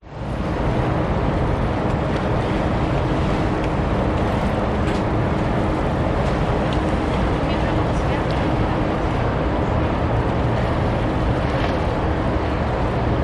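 public places city quay boats passing distant voices water lap sydney harbour; by opera house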